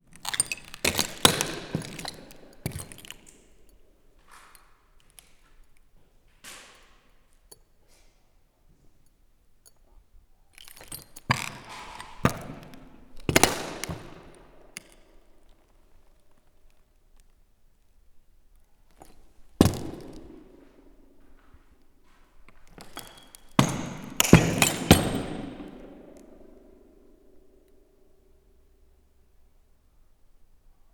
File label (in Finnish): hylätty kaivoshovi, Lampinsaari